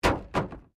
WRECK BONNET PANEL IMPACT METAL, Wrecked Cars; impacts, crashes, scrapes; Sound Effects:Objects

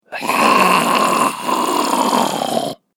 Zombie Gurgle Growl; Zomby Sound Effects; horror

